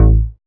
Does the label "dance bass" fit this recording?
Yes